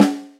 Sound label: ROCK SN; DRUMS; Best Service; Alpine Volksmusik